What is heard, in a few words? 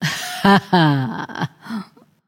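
heh heh, female; smite; voicepack; hera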